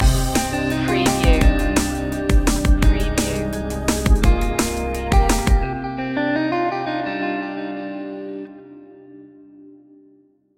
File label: chillax stinger